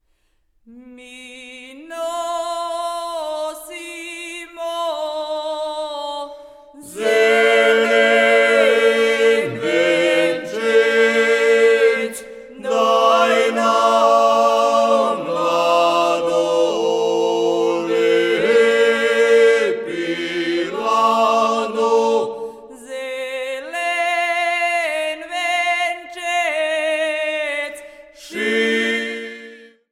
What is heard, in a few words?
acappella; Ladarke